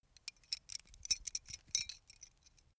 metal keys sparse handling

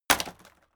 impact sweetener wood shatter medium

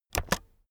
keyboard external space press fast

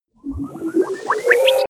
sweep

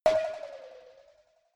cowbell move soft

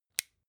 flashlight release

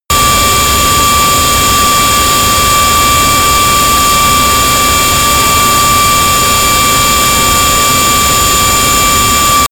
plane airbus a320 turbine full power direct

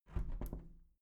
window plastic squeak close soft

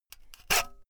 saw use short fast unsteady

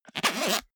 zipper small case open fast

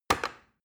plastic button start click heavy fast

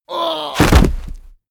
infantry die

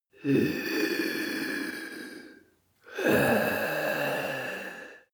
breath male moan

